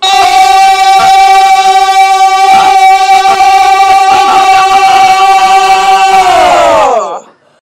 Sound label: AAAAAA